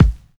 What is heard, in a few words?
boomy kick drum g sharp key, Royality free steel kick drum sample tuned to the G# note